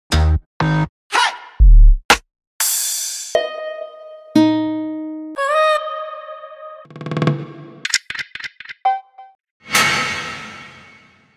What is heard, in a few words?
one-shots